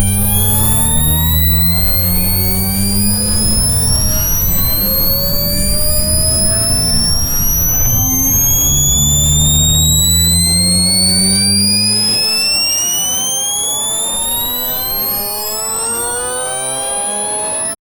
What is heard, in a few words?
charging magic laser